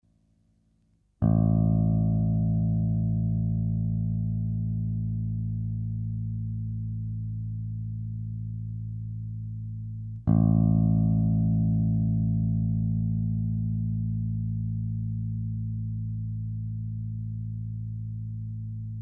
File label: BASS 440; meting bass